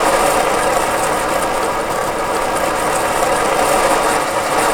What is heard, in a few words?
spinner Skate